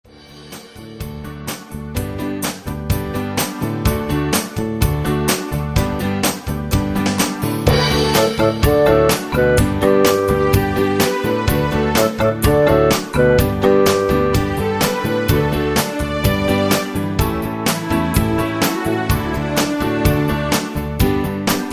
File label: instrumental